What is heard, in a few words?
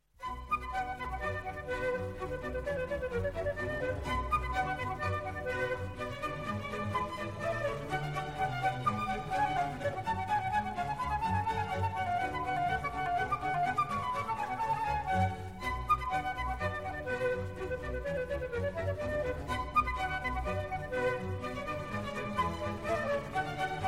Orchestral Suite in B Minor, Badinerie; Orchestral Suite No. 2; BWV 1067; Academy of St. Martin in the Fields